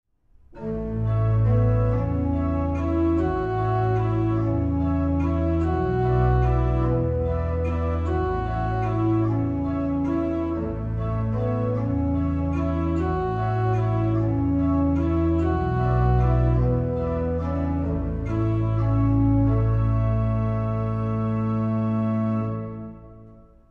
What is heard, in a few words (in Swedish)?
Vals, orgel; Spela orgel